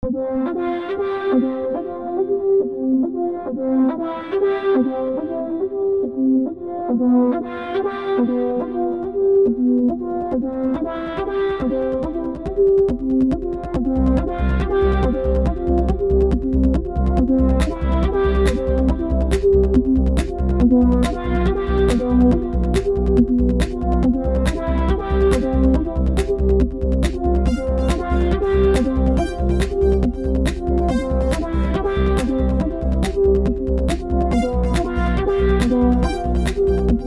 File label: nice electronic sketch